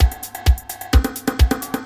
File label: Loop, Roland; Sound & Vision - Gigapack I